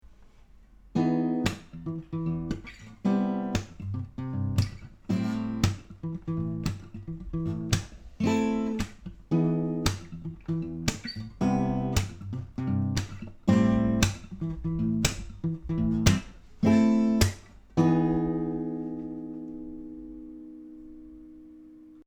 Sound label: stereo guitar microphone sample; Roccat Torch